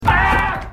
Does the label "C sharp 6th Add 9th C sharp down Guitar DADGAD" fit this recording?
No